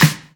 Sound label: Snares; Vengeance Samples; VEH2